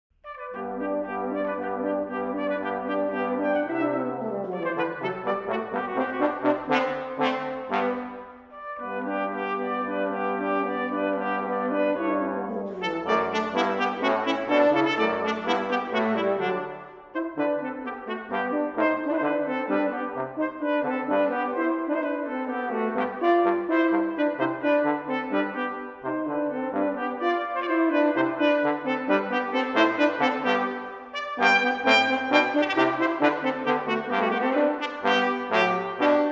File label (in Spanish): Trio de metales; trompeta / trompa / trombón